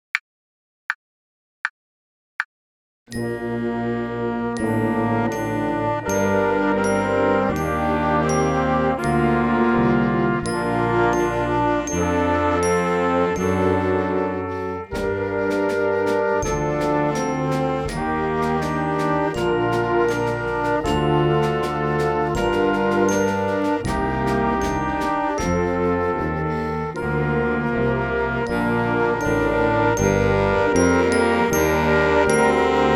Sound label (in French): à la claire fontaine (classique) noire à 80, accompagnements